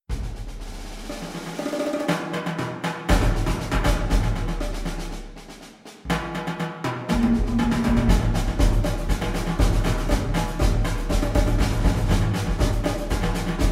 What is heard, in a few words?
percussionata